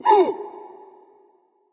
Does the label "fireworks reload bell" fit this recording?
No